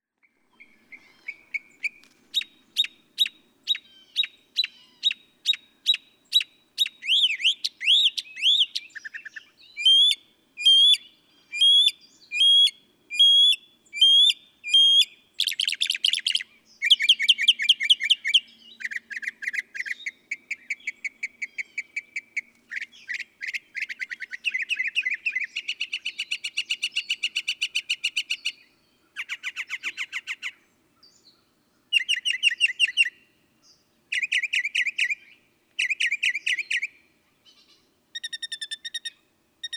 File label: Northern Mockingbird